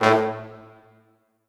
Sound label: Orchestral Brass, A1